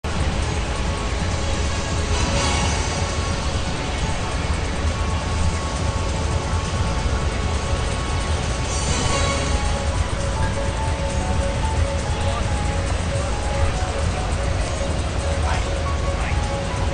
oldskool ambience; asm2k

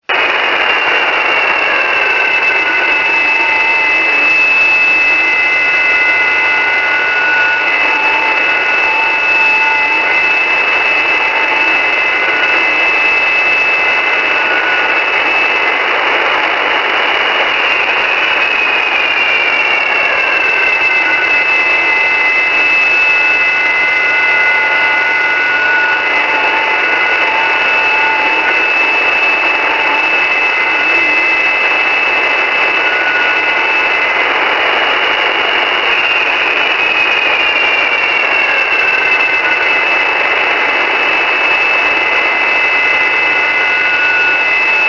strange stalingrad clock freq

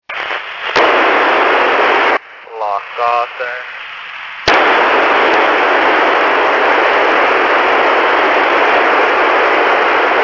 finnish roadworks

